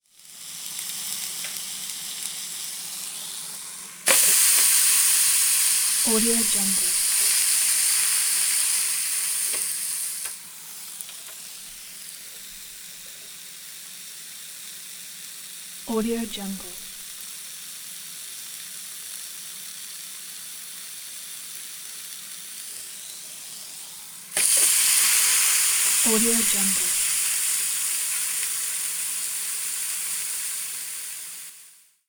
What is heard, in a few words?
grilling steak on electric grill; applehillstudios